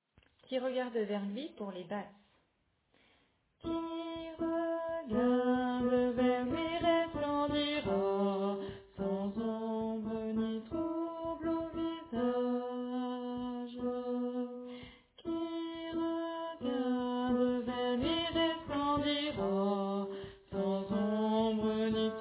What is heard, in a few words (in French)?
Basse; St-Jean-Baptiste-de-la-Ste-Famille